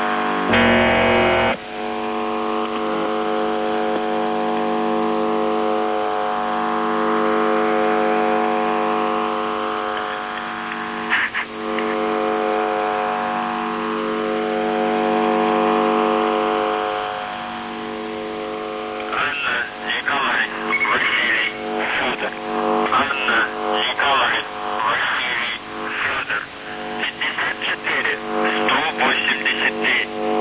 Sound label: buzzer usb msg